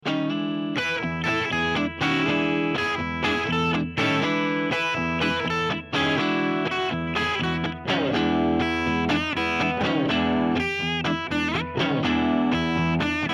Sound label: toast bar bridge neck